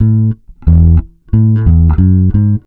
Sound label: COUNTRY